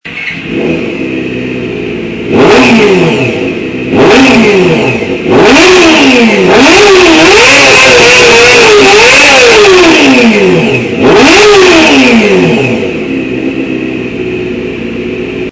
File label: Roo Racing